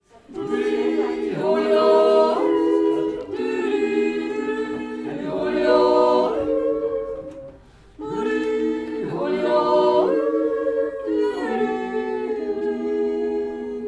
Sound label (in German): Jodler